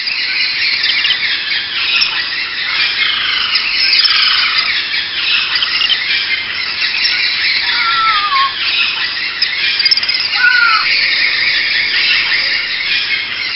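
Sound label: ambience jungle critters; secret garden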